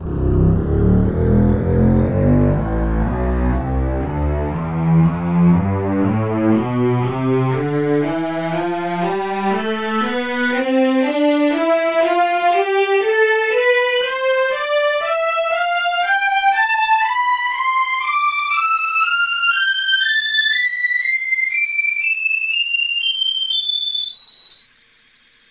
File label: slow strings tonleiter